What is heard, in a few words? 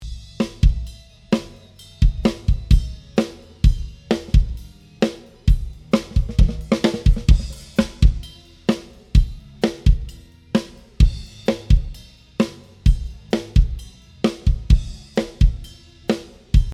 kick snare eq, la même chose (juste kick et claire-dessus), maintenant correctement égalisé et avec un poil de reverbe (Plate) sur la claire; batterie